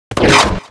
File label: SKILL ATTACK